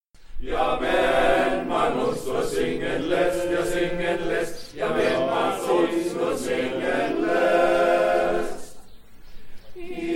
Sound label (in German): Strophe ohne Applaus; Netz Säcke